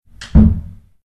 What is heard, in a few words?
Locker Close; Decay: The Mare